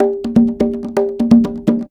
Percussion Loops, Bongo & Conga; Houseworx

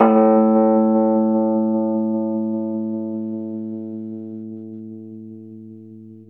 Rhodes 73 Slow, A; E-MU Producer Series Vol. 5 – 3-D Audio Collection; 3DRhodes

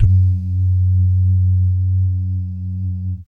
BS DUMM, Vocal Planet Jazz & FX; ILIO; BASS DUMMS

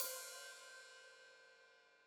Hat Open, Hi-Hat; Deeply Sampled Drums

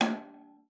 G#5, VIOLA FINGER; Xsample VOL-5

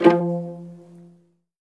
violin G; ILIO; Synclavier Strings

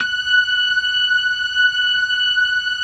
LO MANUAL VIB, Organ

